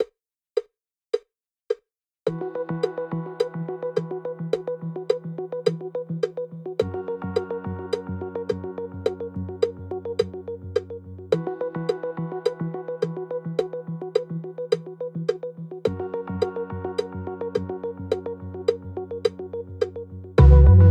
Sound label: with click 106bpm